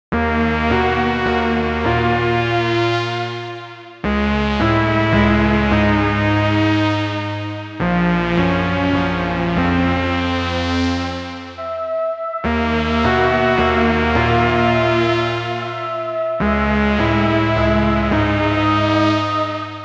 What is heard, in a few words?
OB-8 Drone Choir Bell